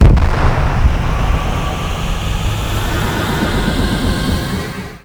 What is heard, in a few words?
Void Dragon Floor Lava Pillar Start Wide; Enemies; Gameplay; Warframe; Duviri